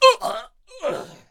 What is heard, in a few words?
Longship Death Vocals